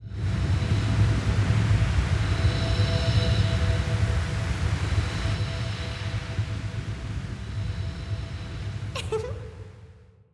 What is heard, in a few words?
Crystal